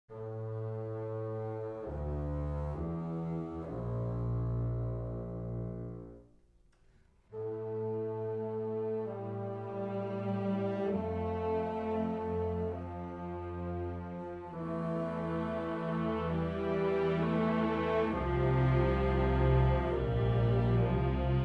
Music

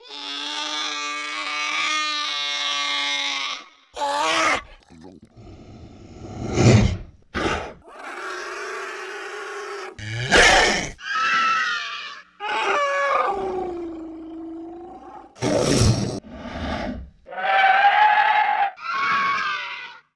Lizard Creature, Vocals - Monster; Sony Pictures Sound Effects Series

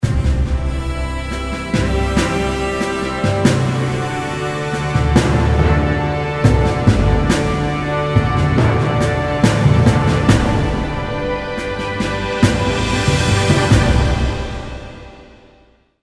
victory, Music

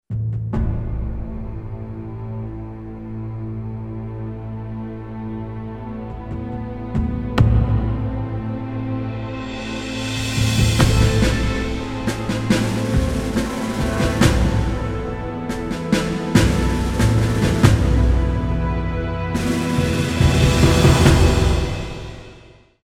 lose, Music

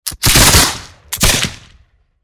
Muskets; Age of Empires III; cinematics

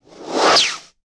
Super Jets Overhead, Sound Design - Sci-Fi; Sony Pictures Sound Effects Series Volumes 1-10; Sony Creative Software

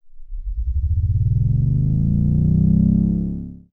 Power Up, Sound Design - Sci-Fi; Sony Pictures Sound Effects Series Volumes 1-10; Sony Creative Software

